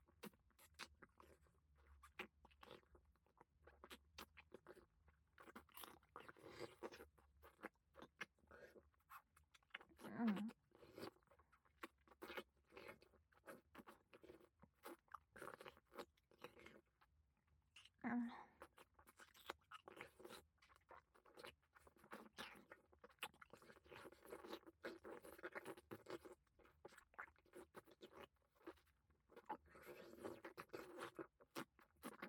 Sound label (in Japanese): フェラチオ, ASMR; アドリブ集